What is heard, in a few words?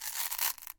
go take out